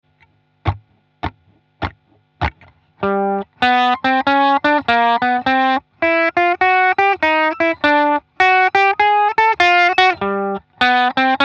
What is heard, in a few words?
blues, guitar